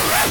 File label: VFX Short Fills & Reverse